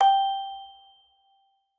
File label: musicbox